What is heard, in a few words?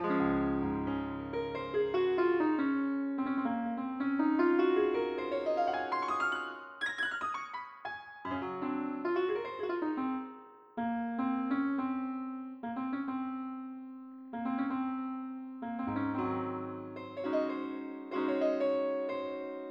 piano